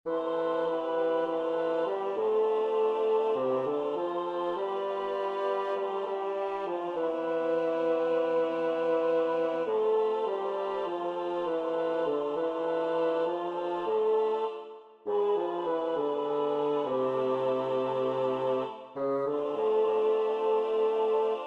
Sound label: Bass